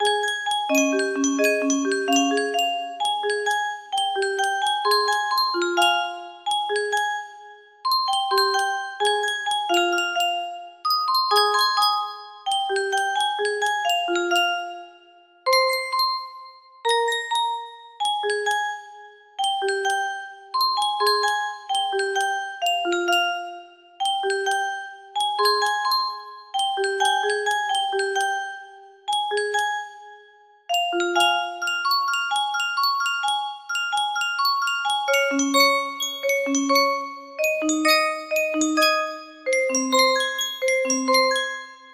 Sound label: Bells (Music Box) music box melody